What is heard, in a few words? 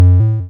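waveform FM triangle triangle envelope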